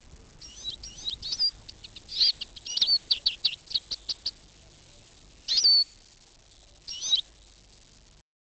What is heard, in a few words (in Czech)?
zvuk, ptaci